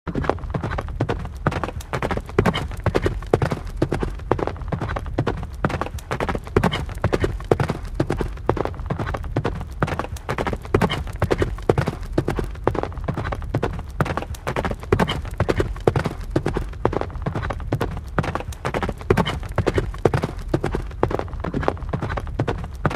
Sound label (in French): equitation sons